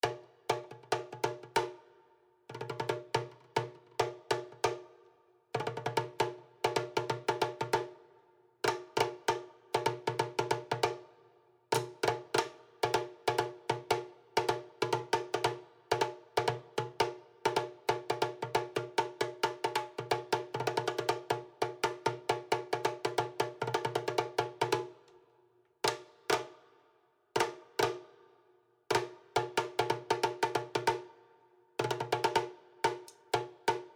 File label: Hunting High Solo; Horizon Zero Dawn; PS4